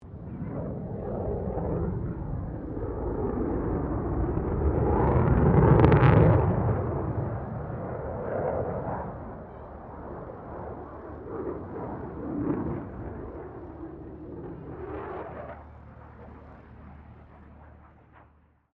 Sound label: AIRCRAFT F16 FALCON PASS; Airplanes; Vehicles